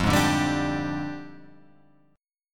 F Minor 6th Add 9th F down; JGuitar

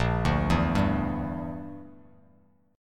A sharp 6th Flat 5th, Bass Standard; A#6b5 arpeggiated; JGuitar

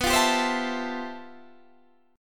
G 13th B, chord

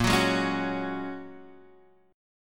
Bb Minor 7th Sharp 5th, Guitar, Half Step Down

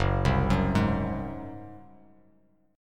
F sharp Suspended 2nd Flat 5th F sharp, Chord; arpeggiated; JGuitar

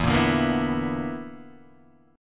B Minor Major 7th Double Flat 5th, chord

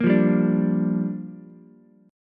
G Minor 7th, Ukulele; Gm7 strummed; JGuitar

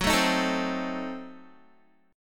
Gb Diminished 7th Guitar; Gbdim7; strummed; 14,12,13,14,12,x

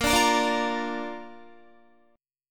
Bb Major, Guitar; strummed; 18,17,20,19,17,17

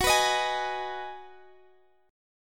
G sharp Minor 7th F sharp

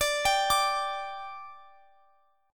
G 5th D, chord

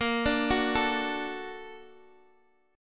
Bb Augmented Major 7th Bb, Guitar Half Step Down

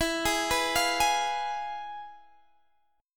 F Diminished E; arpeggiated

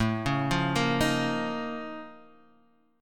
Bb Minor Major 11th A

